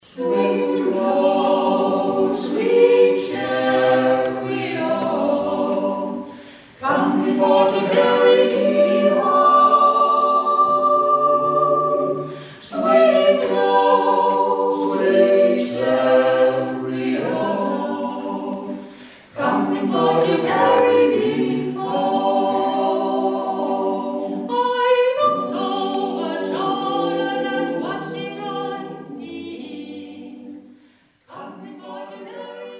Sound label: swing low